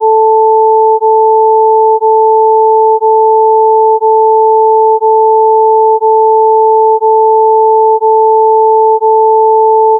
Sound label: abrupt phase shift with fade transition